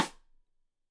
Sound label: Side Stick Stereo; Deeply Sampled Drums; SMDrum